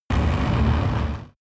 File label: extrinsic drone storm; energie